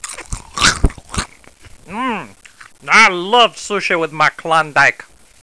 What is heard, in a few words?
welcome sound; 3D Klondike v.1.0a